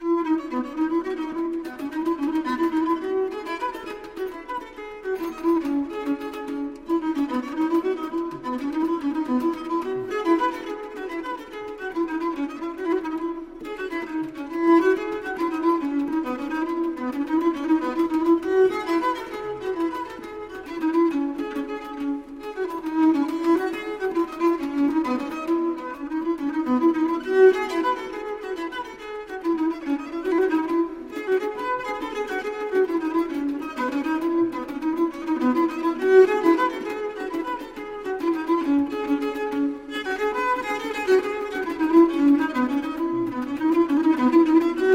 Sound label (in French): lira, les violons